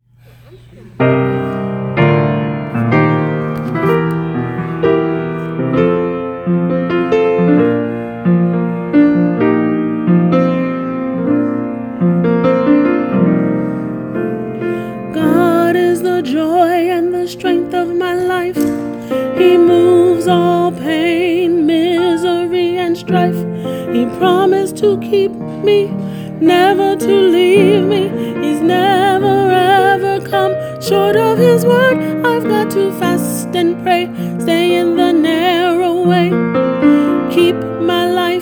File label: Soprano